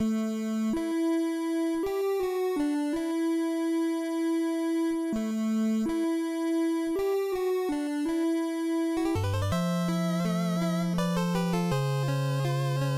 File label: Prelude NES